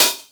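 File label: open hi hat ANALOG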